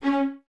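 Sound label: violas C#4 staccato, String Ensembles; Miroslav Vitous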